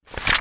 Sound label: pageflip; Great Book Of Magic; Wazdan